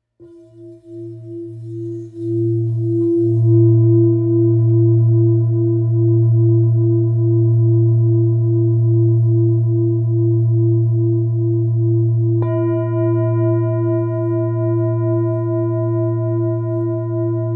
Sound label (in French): Bol devjam 2.13kg la diese